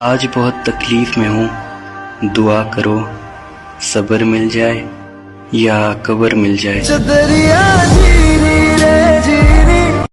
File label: sad hindi